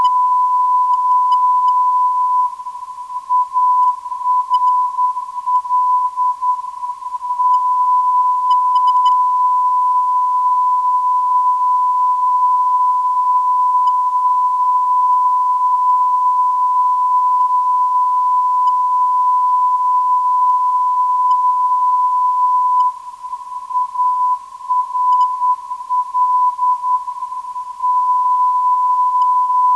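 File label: non-directional beacon; ARL; 293kHz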